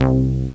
bass; Descent II

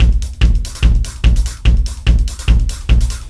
Particle Beam Beat, processed drum kits; Zero-Gravity Beats; Sonic Foundry

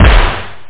snare drum

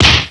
ninj slap; ENOMERCY; noyz

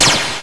cold damage; Exile III v1.0

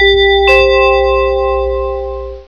Snap Chime; System 7 Snap Mail Package

